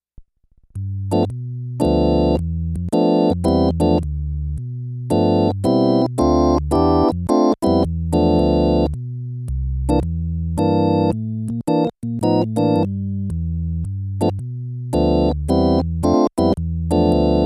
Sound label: Electro Naked